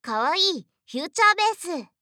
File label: kawaii future bass